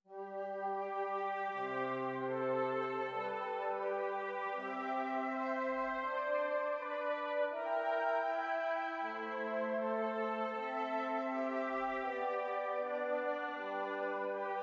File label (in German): Stimmiger Contrapunkt; Ronge